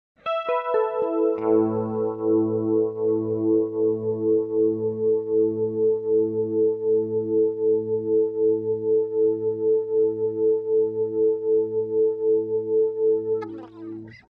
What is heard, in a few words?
Electric Guitar Harmonic Arpeggio With Flange; Hollywood Edge; sound designer's toolkit v2